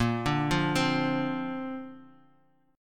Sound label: Bb Major Add 9th, Bb, down; arpeggiated; JGuitar